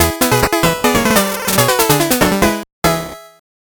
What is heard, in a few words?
8bit jingle normal